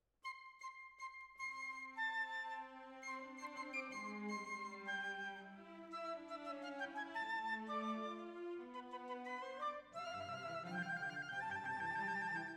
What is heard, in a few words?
Violins, jazz for piano